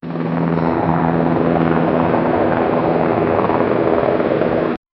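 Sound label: police helicopter fly away; emergency services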